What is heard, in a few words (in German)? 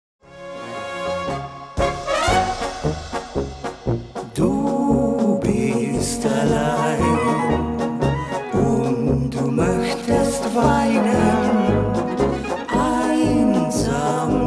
Blasmusik